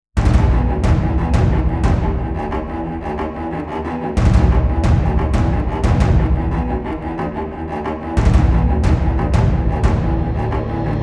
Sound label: Tension Drama Building Film Trailer Cello and more with drums